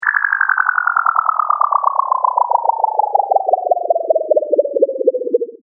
Power down, Power Down Of Scifi Electronic Device Or Machine; Control Panels; Control Panel Sound Effects; Control Panels (Sound Effects:Science Fiction); Stuart Duffield